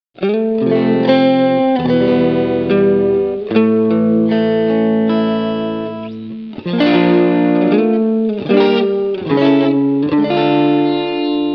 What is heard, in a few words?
coin